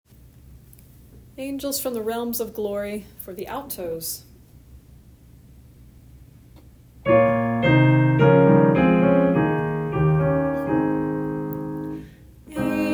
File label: alto; Angels realm